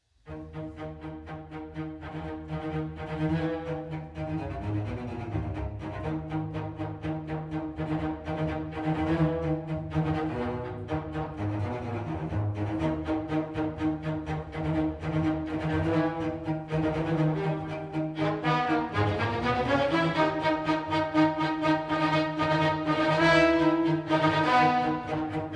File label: Symphony Scherzo Trio